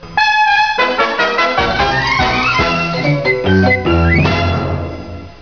tune

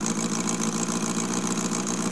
ENGINE

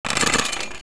CURTAIN

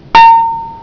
kling

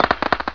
STATIC; WARPATH

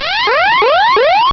alert; sci-fi sensation

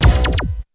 LASER SHT; BEAT2000

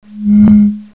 OH HA OHH

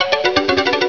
BLIPPLE

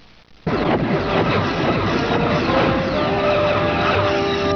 photons

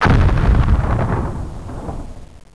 EXPLOD; THEXDER

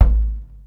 LOOSE KIK; BEAT2000

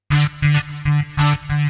ZIPPER, KEYBOARD, HOUS TEC; MAGIX

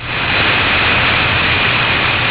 STEAM, KITCHEN; EFFECTS

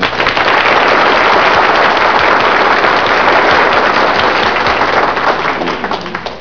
APPLAUSE

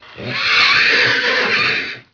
mutant

